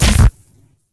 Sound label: tele damage, poltergeist; Soundscape Overhaul; monsters